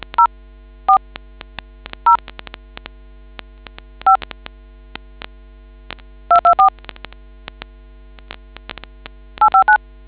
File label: dtmf